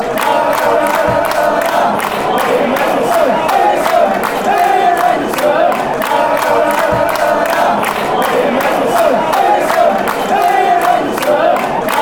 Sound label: song, fanchants; liam henderson